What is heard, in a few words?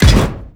biomech walk hard